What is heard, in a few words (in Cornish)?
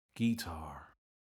Gitar